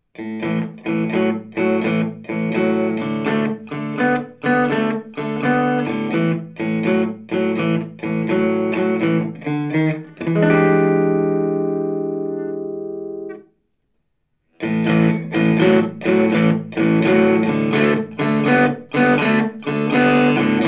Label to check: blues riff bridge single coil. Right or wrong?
Right